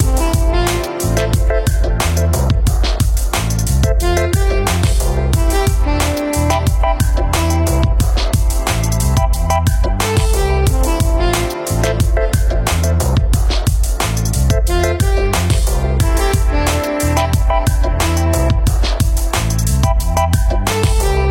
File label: Music RnB Soul Hip Hop